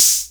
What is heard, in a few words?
RD-9844, DRUMS + PERC; Rubber Chicken Software Sample Bank; OTHER DRUMS